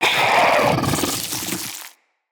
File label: Root Spider Elite Vocal Attack; Animals; Spiders; Vocals